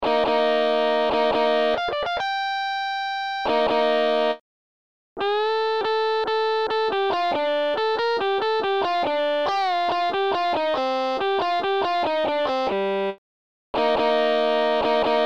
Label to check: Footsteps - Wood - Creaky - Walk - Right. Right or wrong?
Wrong